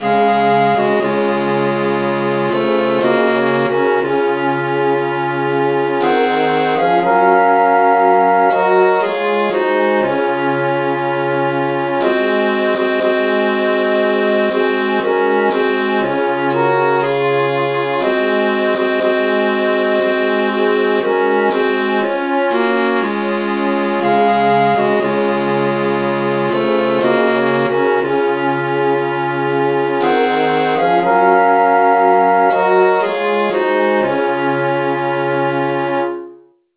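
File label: traditional key G flat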